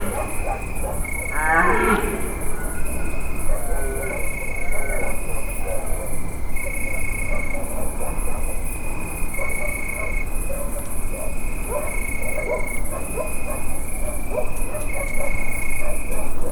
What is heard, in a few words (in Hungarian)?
tücskök kutya, gímszarvas; termeszetben